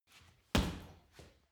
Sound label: punching bag kick hard